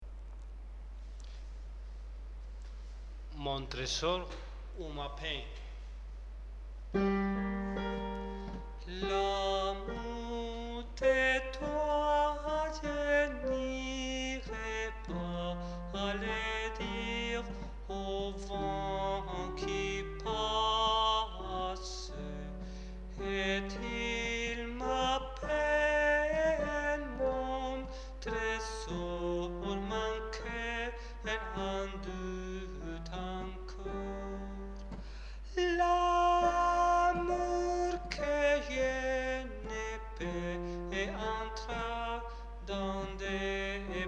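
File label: soprano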